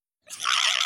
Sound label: goofy ahh scream